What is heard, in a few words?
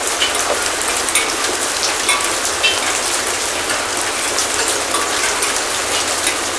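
metal rain medium loop, rainscapes; ambient, ambience; Left 4 Dead 2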